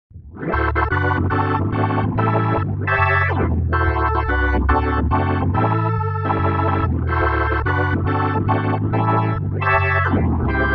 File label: Burner, Organ; PC3; Ezras